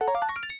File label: Phone Off; Samsung SGH-i740